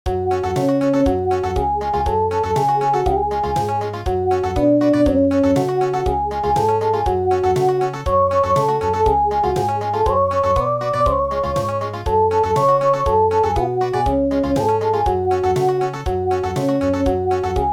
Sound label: Country; daikoku